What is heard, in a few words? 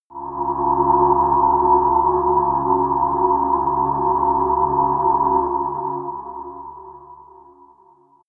sci-fi quiet planet, drones